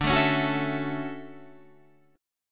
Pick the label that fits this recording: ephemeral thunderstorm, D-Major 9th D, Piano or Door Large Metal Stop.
D-Major 9th D